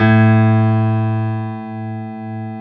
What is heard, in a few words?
PF STB A 2 L, S GRAND PF